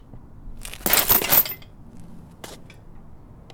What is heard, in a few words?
Chains Rattling